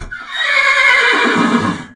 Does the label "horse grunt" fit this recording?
Yes